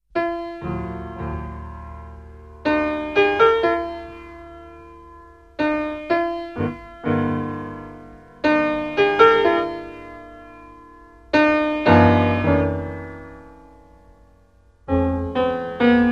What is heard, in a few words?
Instrumental